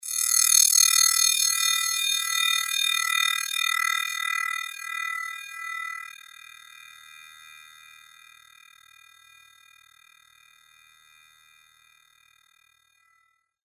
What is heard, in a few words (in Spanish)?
cristal vib; la parte por el todo